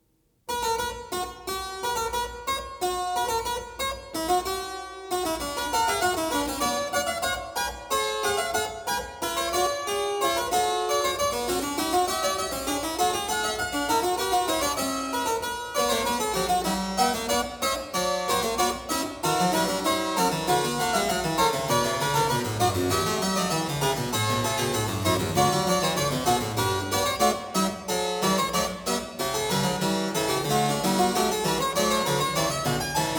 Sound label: Fugue, Well-Tempered Clavier, klavier; BACH; WTC 1; Fugue 2; BWV 847